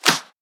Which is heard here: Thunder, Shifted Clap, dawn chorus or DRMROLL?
Shifted Clap